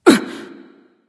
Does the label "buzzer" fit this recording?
No